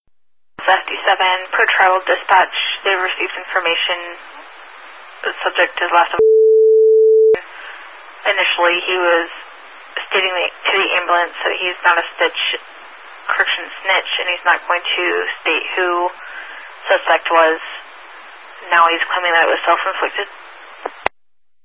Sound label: bleep